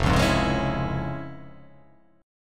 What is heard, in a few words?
F Minor Major 7th Sharp 5th, chord; 1,4,3,1,1,3,3,1